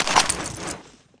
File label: clip draw, colt python